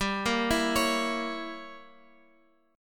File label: G-Diminished 7th-G-down-Guitar-Open F; arpeggiated; JGuitar